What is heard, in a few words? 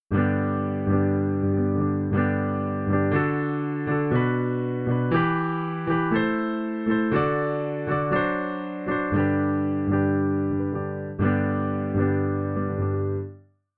Strummed